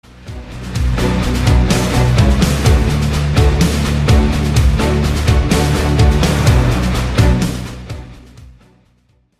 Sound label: rock cover; dragon ball super